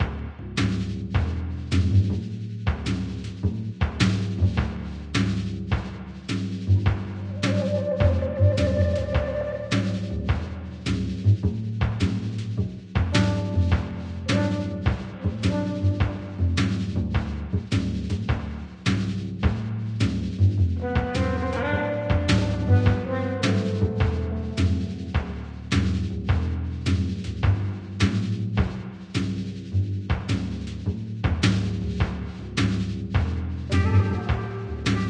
Smoking Room Loop Bass; Ether One Redux

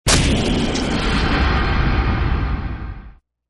Mission Failed; Urban Reign

